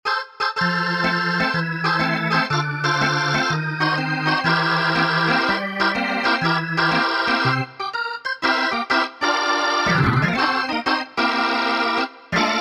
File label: organ